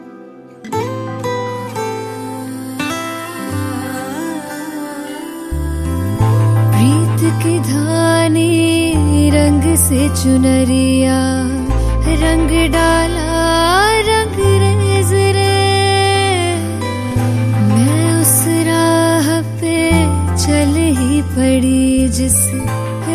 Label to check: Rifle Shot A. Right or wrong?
Wrong